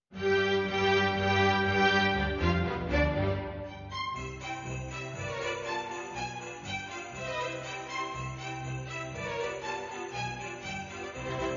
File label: Sleigh Bell Polka String Orchestra; Srauss